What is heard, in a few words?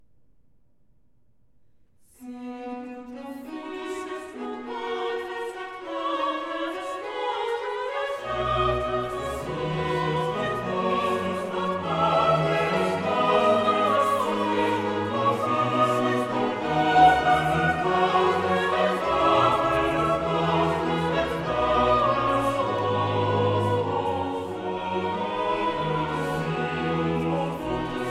Magnificat in C major; Kuhnau; Sicut locutus est